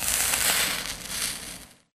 imp bullet energy default sizzle; Titanfall 2

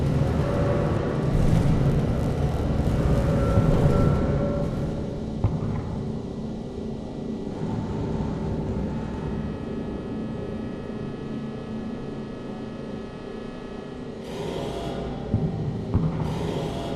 AMB EXT Above The Dome Above Screens; Boomtown; Titanfall 2

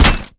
bullet light ballistic spectre; Titanfall 2

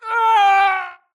grunt death crush; Titanfall 2

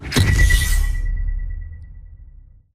Map Hack Radar Pulse; Burn Card; Titanfall 2

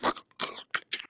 spectre chest punch idle grunt; Titanfall 2

